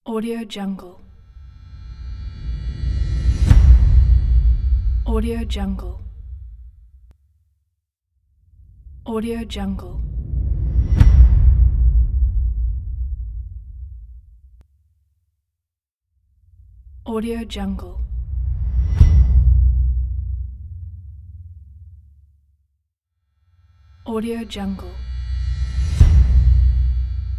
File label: impacts